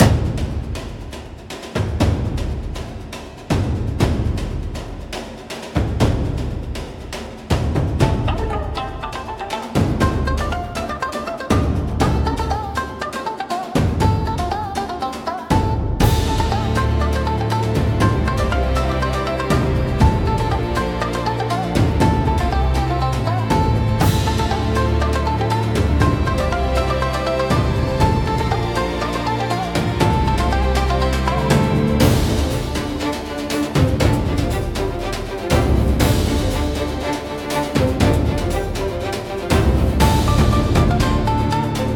Thunder Drum Chase, cinematic japanese style battles